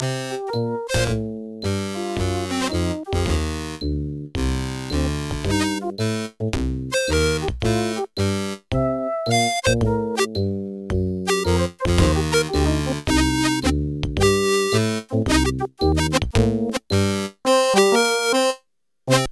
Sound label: OPL3; sim city 2000